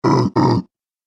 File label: Giant Ogre Ug Ugh, monster; Monster Sound Effects; horror sound effects